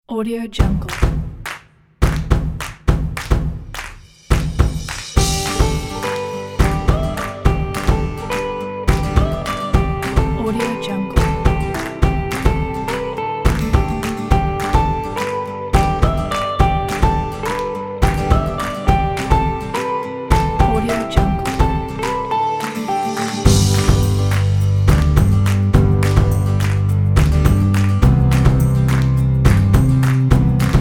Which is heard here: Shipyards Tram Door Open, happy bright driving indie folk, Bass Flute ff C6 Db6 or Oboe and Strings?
happy bright driving indie folk